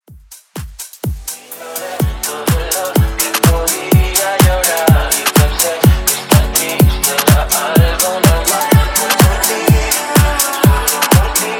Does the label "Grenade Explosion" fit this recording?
No